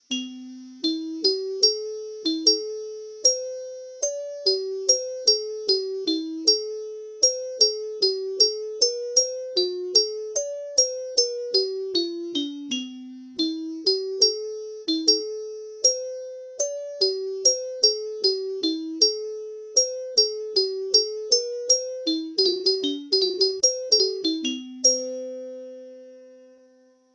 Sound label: anchors aweigh, chimes; chime collector; custom chimes